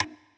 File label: glitch tile; gonner linux macos ps4 switch windows xbox one gamerip 2016